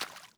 Foot Wet Puddle Run

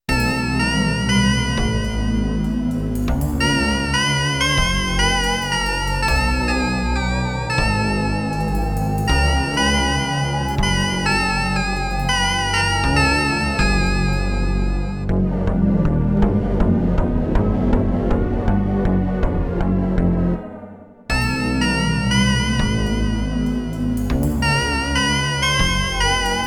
Haunted House; Doukyuusei if; Saturn Edition

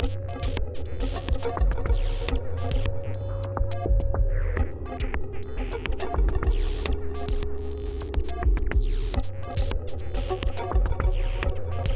Loading Background; rive wreck hack die retry; ps4

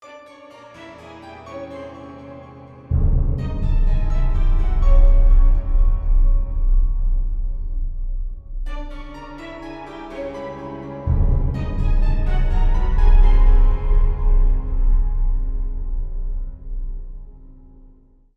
Castle Front

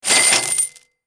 Ui Spend Currency

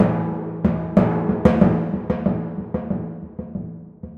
Timp

